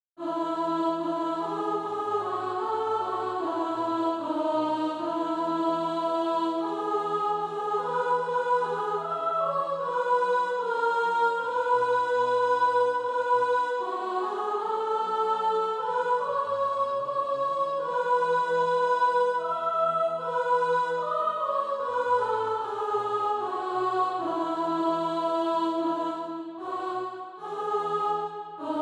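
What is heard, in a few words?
sop, Practise Music; Children of Jerusalem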